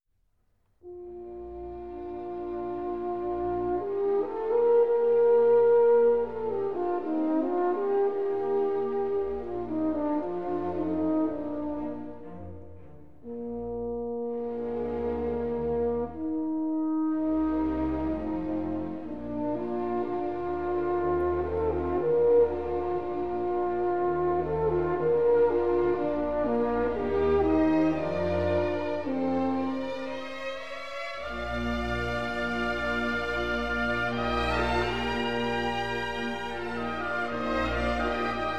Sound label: Horn Concerto No. 4 second movement; Mozart; Legend of Galactic Heroes; Galactic Empire SIDE